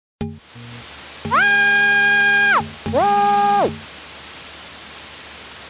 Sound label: power on with backfeed, printer; zt231